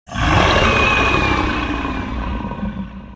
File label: roar; yharon